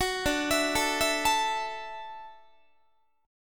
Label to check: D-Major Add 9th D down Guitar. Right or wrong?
Right